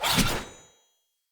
Short Sword Whoosh Heavy C; Weapons; Enemy; Swords; SinecureShortSword

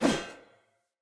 Polearm Whoosh; Weapons; Player; Sphere

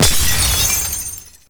Glass Ring Partially Destroyed; Warframe

